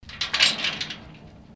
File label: key use